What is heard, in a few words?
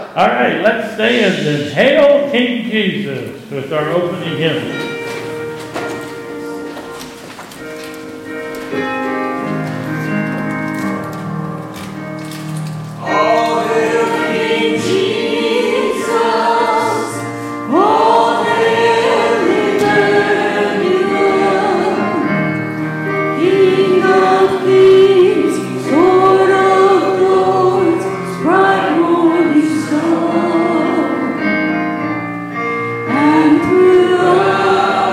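Hymn, church service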